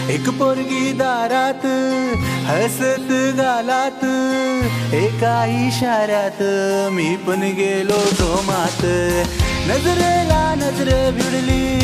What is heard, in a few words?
Marathi Song Ringtone